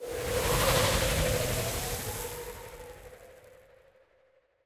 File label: Sandstorm